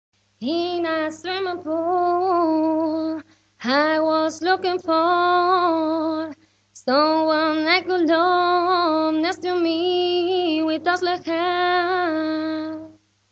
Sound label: Vocal